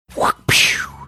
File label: making whip cracking sound